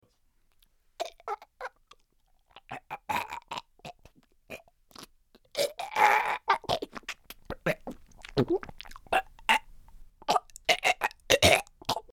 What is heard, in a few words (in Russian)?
zvuki smerti i boli